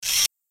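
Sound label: Mechanism Fast Metal, servos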